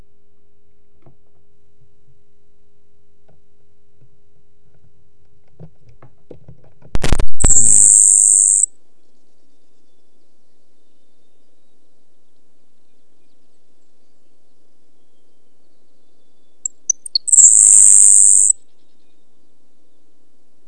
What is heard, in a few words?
Grasshopper sparrow; bird songs of WA calls